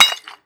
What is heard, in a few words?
Pick Hit, Shatter Stone; NV3D